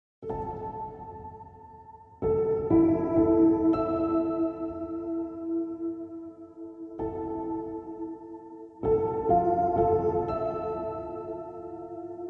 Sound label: anxiety; celeste